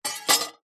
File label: warfare sword small set down on hard surface